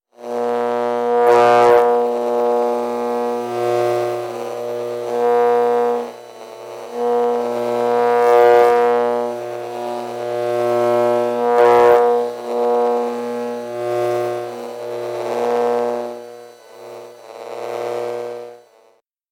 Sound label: Microwave Oven